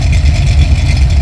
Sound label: idle, Kart